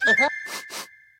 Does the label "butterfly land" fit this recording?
Yes